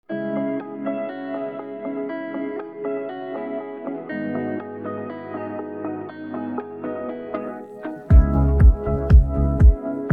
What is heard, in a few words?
instrumental, persian